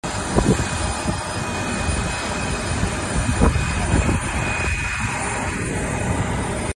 Tupolev Tu-154; توپولوف ۱۵۴

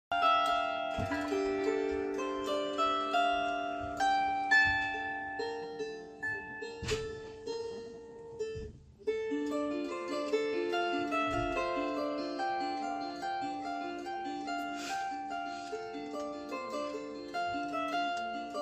Gusli

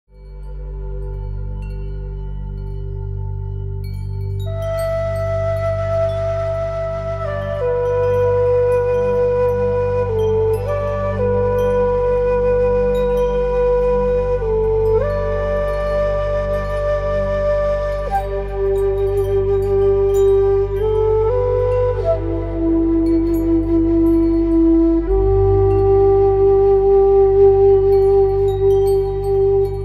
Buddhist flute; فلوت بودایی

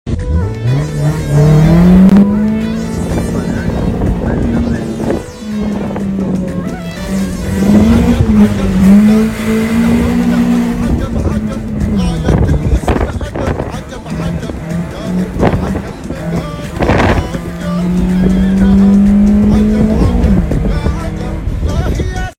Bee exhaust

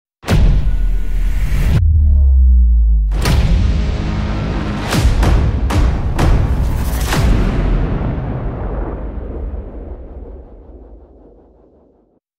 hit for teaser; ضربه برای تیزر

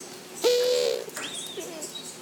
zenaida doves; کبوتر زینایدا

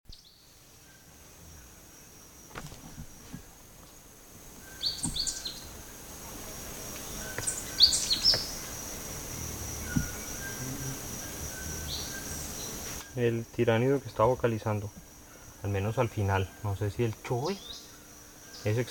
forest elaenia; پرنده الینیای جنگلی

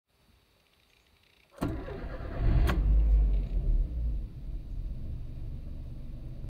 Pressing car switch

